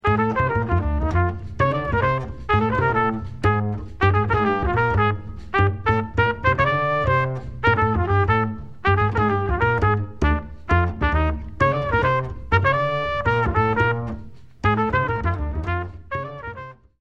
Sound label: minus drums; count off; play along with the trumpet & bass on melody [2 choruses]